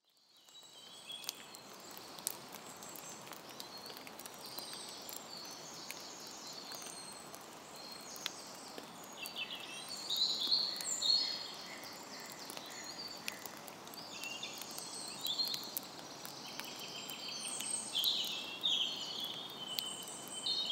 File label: April Birds and Quiet Rain